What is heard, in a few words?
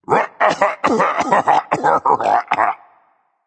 laugh berserk; cuchulainn